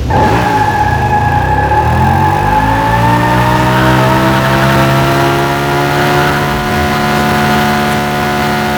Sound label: Caterham first gear rev loop; vehicles; garrysmod